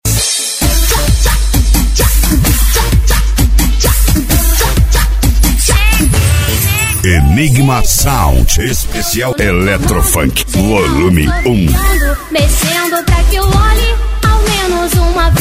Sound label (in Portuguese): especial eletro funk